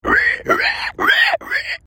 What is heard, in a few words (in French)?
bruit de cochon